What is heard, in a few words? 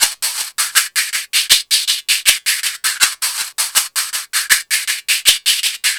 Percussion, R&B Drums